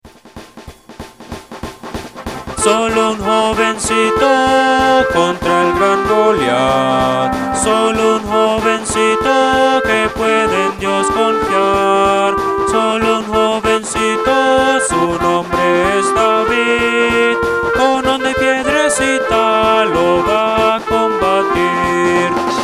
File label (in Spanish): Solo un jovencito, himnos cristianos